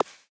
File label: Sticker Place; StickerClone